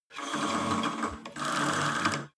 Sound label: cable machine old pull release creak, fitnessstudio; sport freizeit